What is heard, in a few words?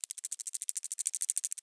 Spider Walk